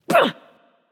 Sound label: grunt attack; smite; kali